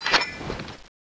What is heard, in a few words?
summon knife draw; cstrike